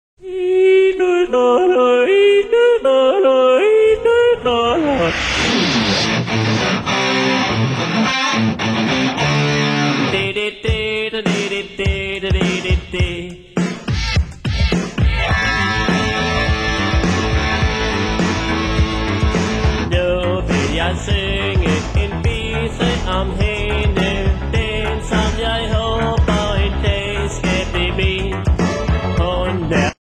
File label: Jodle Mix